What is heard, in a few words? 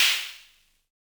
Snare, Univox SR-95